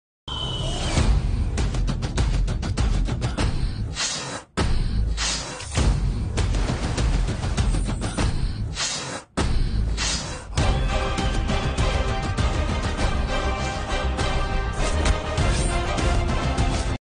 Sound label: Trailer Background bgm; kanguva; surya